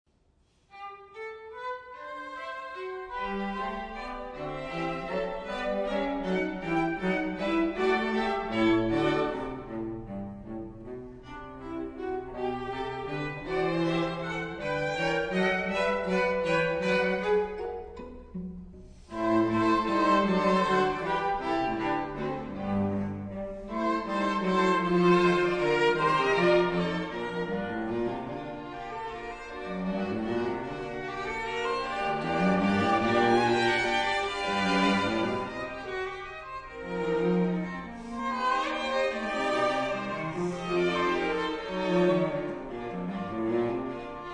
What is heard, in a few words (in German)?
Streichquartett c-Moll Menuett Trio; Hans Rott; Mainzer Streichquartett; IV. Menuett - Trio; acousence